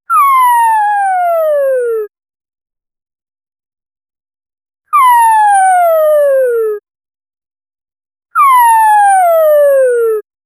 funny cartoon running; comedy

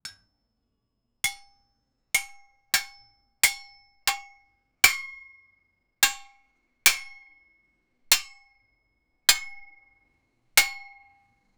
a hammer striking an anvil with a metallic clang

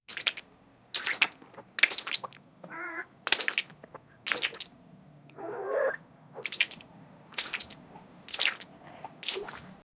cat running water splashe, yowl

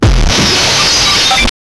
explode with glitch

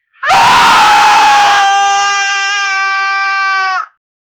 pumpkin monster scream; creepy

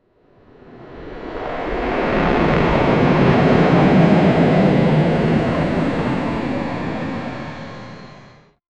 airplane woosh, fly by

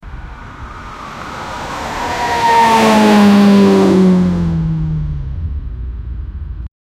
cars are falling from sky

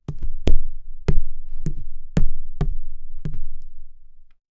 create a tapping sound co, leather

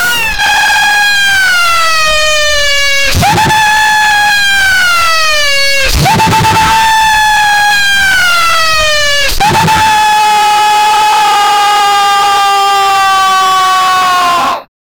a jump scare of a

